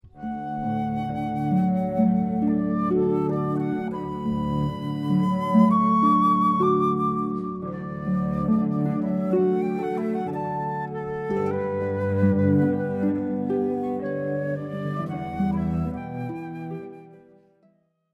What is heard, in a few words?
Opera theme, harp flute cello